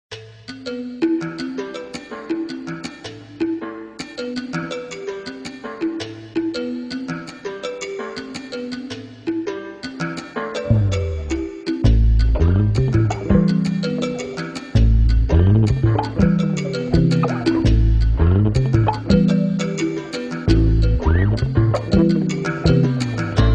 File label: Guitar